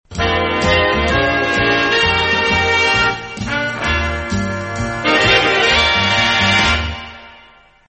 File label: short instrumental intro; australian otr promos and idents